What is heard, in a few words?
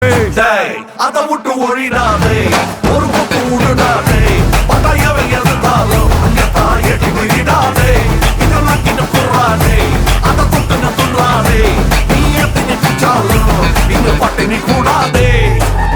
Ringtone; vikram